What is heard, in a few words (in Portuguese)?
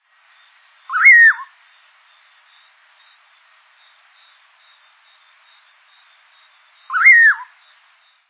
bacurau